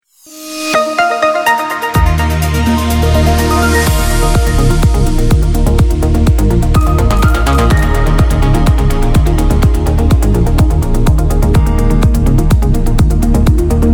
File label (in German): Verkehr